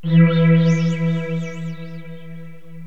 btn; Designer Bodies

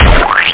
sploish; brutalt

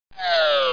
close; Bible Fun Pack; MAZE

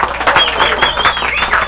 Applause

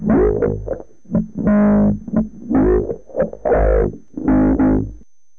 dirty rhythm

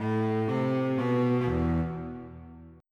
cello; Hodj 'N' Podj; Expert Software

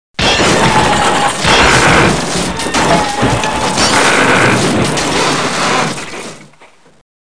roof pull

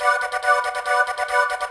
syn voc, keyboard, 140bpm; hous-tec

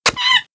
monkey get hit; Immortal

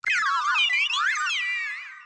Sound fx spider; The Interactive Alphabet

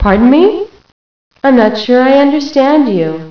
HUH

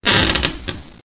Squeaks

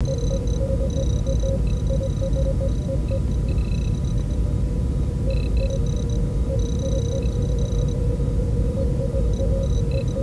Bridge TNG; TrekForMac

